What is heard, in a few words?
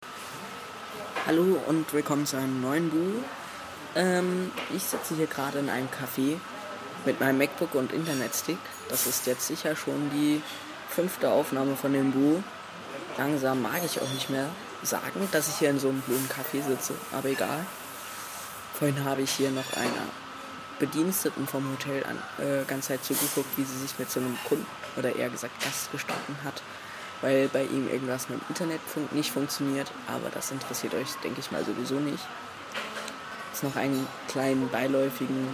cafe